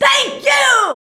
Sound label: VOX, Singin